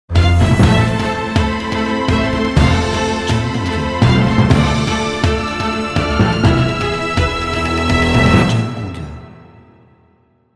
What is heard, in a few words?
Epic Cinematic Logo